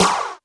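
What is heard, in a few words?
Snare Flange Shot, Alesis D4 drum machine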